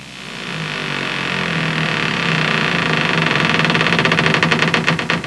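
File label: CREAKING; casa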